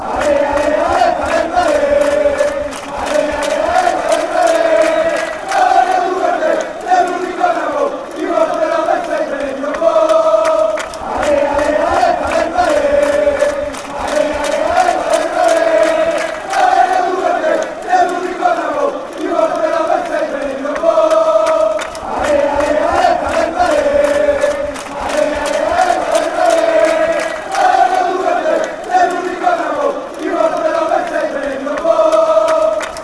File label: ale ale ale, fan chants